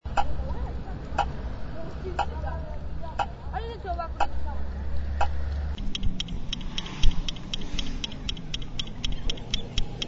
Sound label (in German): ampel